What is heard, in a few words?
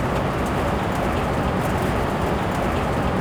road roll, tires; Kart; AOS RF1 Kart Demo